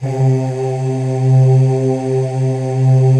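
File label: PAD, atmospheric pads; Best Service; XX Large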